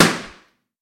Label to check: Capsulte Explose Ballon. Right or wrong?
Right